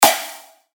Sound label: percussion; Gasket; revealed percussion vol 2